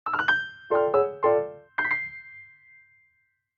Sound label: grace note; Samsung; Galaxy J3 Prime